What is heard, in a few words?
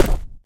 charger run right, footsteps; player; source engine; left 4 dead 2